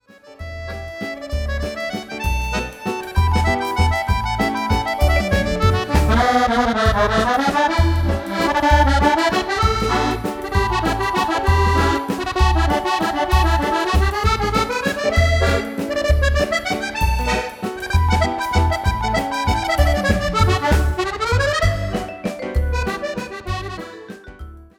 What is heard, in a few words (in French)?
valse